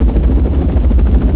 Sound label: HELI IN; ASSAULT; PC Gamer 46